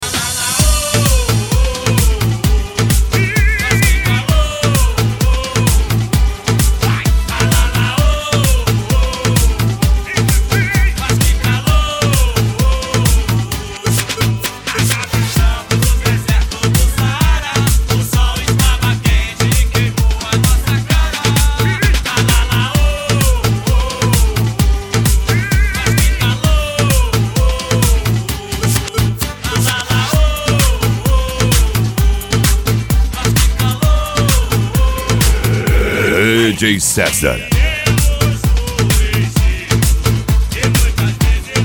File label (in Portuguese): sound car carnaval